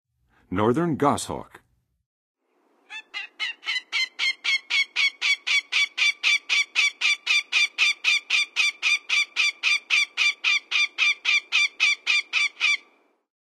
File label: Northern Goshawk, Birds of North America; Western USA; Western USA Songbirds